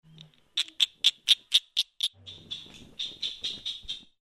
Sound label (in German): froesche